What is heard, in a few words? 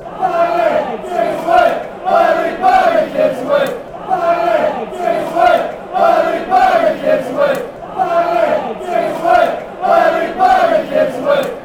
song, fan chants; bailey